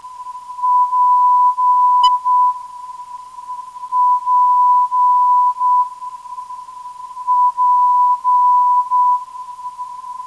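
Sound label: non-directional beacon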